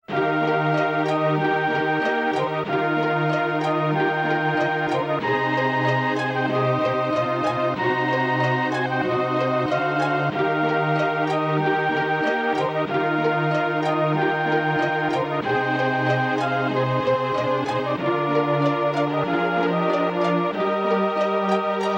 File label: happy town; Breath of Fire